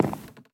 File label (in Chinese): 木头踩踏声